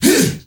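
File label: MALE HEHA, SHOUT; Spectrasonics; Heart of Africa 1; CH. SHOUT2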